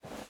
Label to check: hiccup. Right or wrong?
Wrong